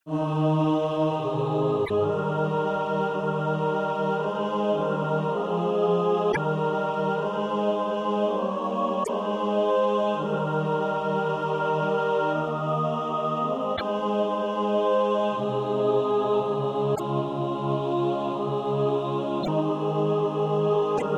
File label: mixed